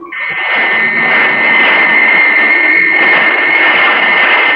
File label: Ancient Noise FX, Industrial